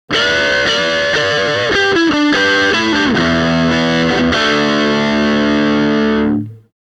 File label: pd-5 blues